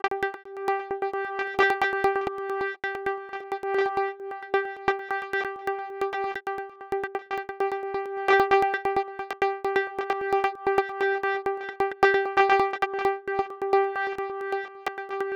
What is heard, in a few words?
synth loop G; echelon; base pack